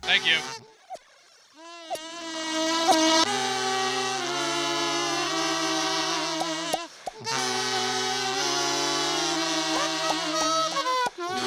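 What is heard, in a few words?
Kazoo Sonata in C major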